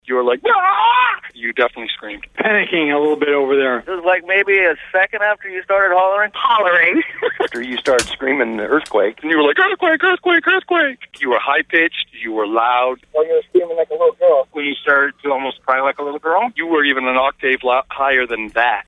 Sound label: Screaming Like A Girl